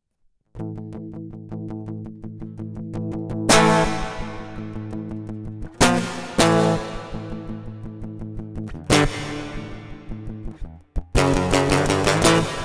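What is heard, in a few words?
acoustic guitar GATE verb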